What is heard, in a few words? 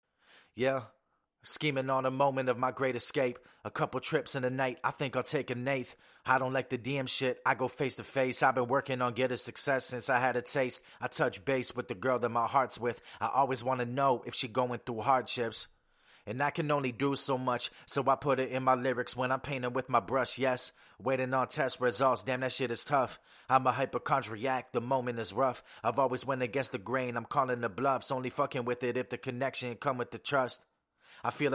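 hip hop 70 Cm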